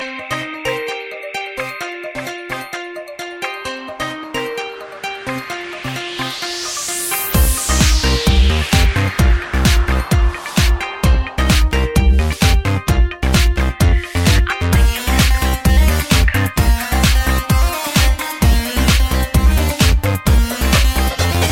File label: Game Theme Tone